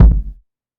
rich lows kick drum single shot a key

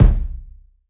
long bass drum one shot d key

